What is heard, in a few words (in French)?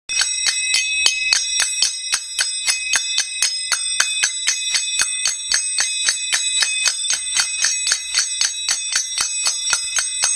cymbales